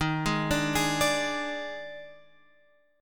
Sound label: D sharp Major 7th Suspended 4th D sharp Guitar Open F, chord; D#M7sus4; arpeggiated; x,10,8,9,11,9; chord shape